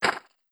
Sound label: drop; cube-tube